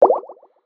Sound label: bubble hit; CrossCode